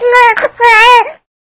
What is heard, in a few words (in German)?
kvaaaaaaaaak, ente